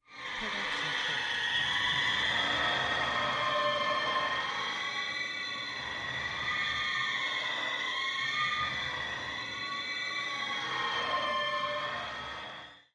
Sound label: Screamy Screetchy; soundscrate